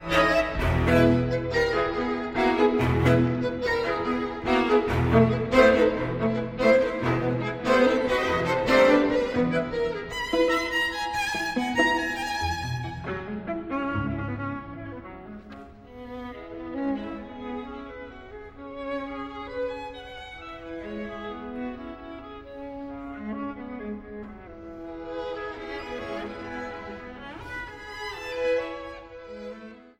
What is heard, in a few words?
String Quartet Vivo molto; String Quartet No 1